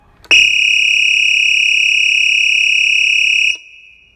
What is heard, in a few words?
Monitor LH25 Whistle; Australian